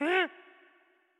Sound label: Hurt; Patella